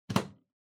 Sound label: Power Strip Floor Gentle Plug In